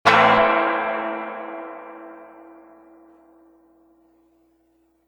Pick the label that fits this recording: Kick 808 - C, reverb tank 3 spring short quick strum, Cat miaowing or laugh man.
reverb tank 3 spring short quick strum